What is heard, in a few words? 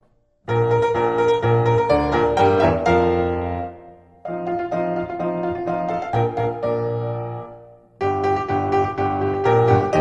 Italy Anthem Piano Cover